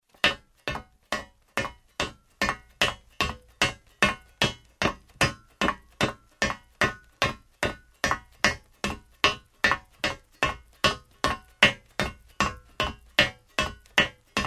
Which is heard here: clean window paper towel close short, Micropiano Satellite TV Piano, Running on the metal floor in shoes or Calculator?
Running on the metal floor in shoes